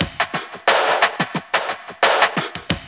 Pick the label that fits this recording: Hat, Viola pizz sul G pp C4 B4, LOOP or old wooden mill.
LOOP